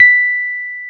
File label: B 5, Electric Pianos; Wizoo Electric Pianos